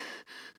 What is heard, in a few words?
Pant; Lewd SFX